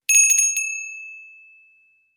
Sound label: Small handbell ring short version